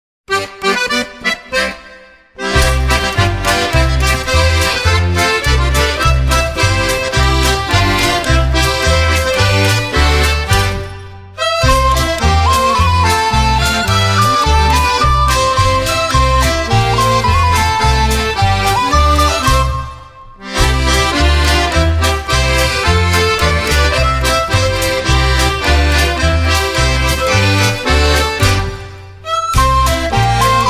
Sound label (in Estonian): polka